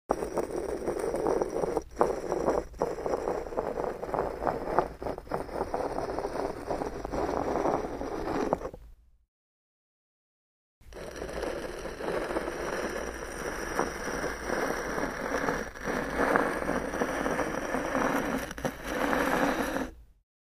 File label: Stone on the concrete floor